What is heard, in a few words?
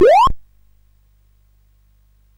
sid CSG8580R5